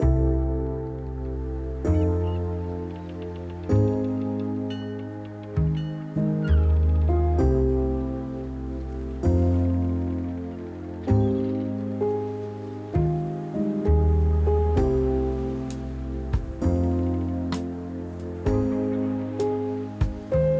music captain cabin

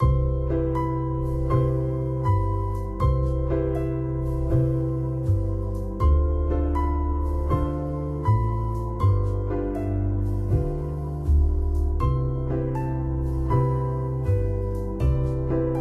music Room

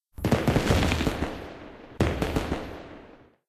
level win fireworks

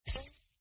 remove Web

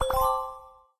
earn point; Valentine

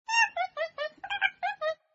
monkey Tap

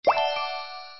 coin; zhifubao

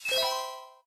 earn Money

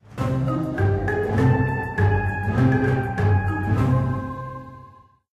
halloween new level

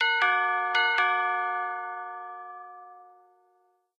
doorbell

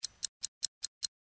effect Accelerate

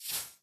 window Close

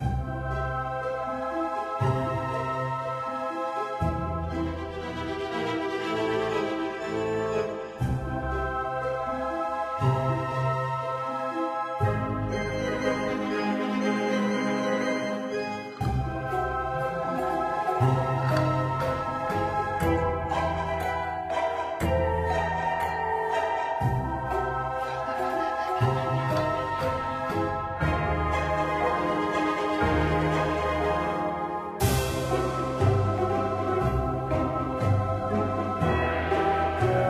music halloween

